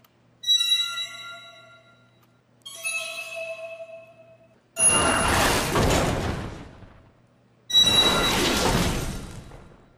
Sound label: Falling Sword